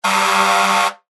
gudok pojarki

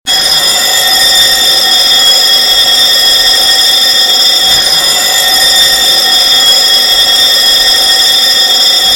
zvuk shkolnogo zvonka

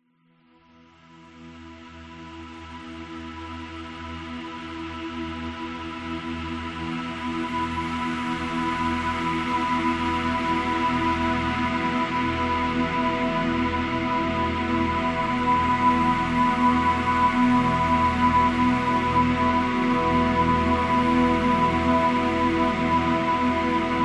muzyka dlya mechtaniy

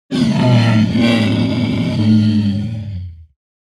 orku bolno plachet

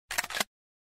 zvuk perezariadki pistoleta

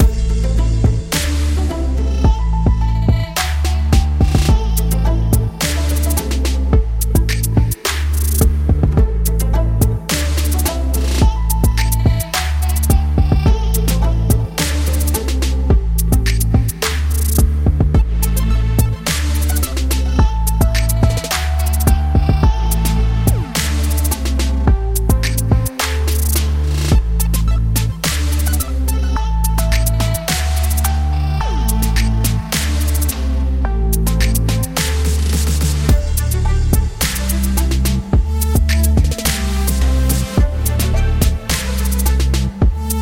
muzyka dlya igr

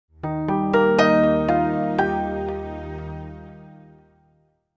melodiya nachala

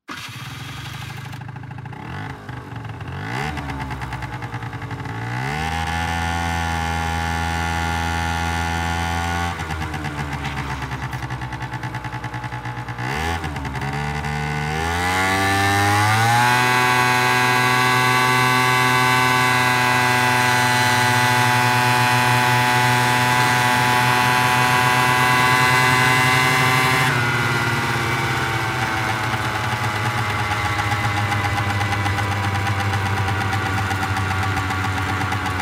zvuk mopeda

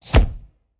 zvuk ischeznoveniya

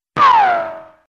zvuk svista puli

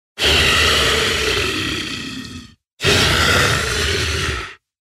ryk orka eeee

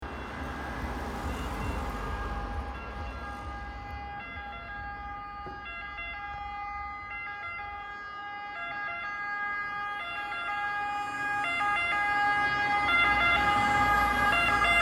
skoraia proehala, zvuk sireny skoroy pomoshi

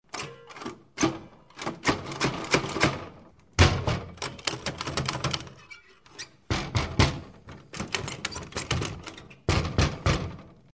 pytautsya otkryt dver stuk

